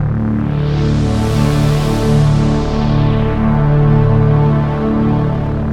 Ana Sweep, Keys, Pads

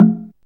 TONGUE BOX, ETHNIC DRUMS; Zero-G Ambient 1

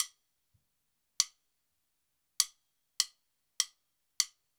Count Off No Vocal 100 bpm; Count Offs

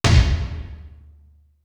TOMBSLAM; East West; Global Sounds; D4 FXS

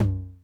Tom Low Accent Mid Tone Mid Decay, 909

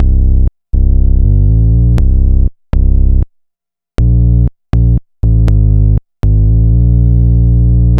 Bass Line; Eclectic Electronica

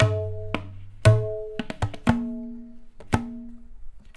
115 TABLA, Indian Traditions; USB Soundscan vol.45; AKAI

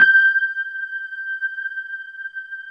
WET RD G5 L, Keyboards Old School; USB Soundscan vol.09; AKAI; WET ROAD

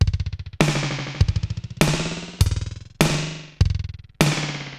Digital Delay RX17 (100BPM), cyborg beats; musicradar

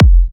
F, kicks; markus hakala kicks

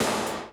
Classic Drum Loops

